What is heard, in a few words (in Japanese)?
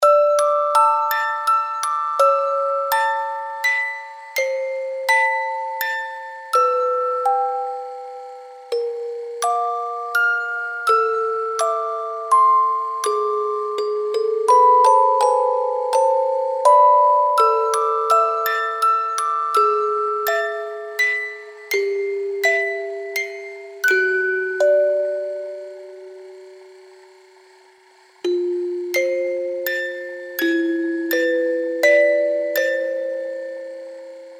bgm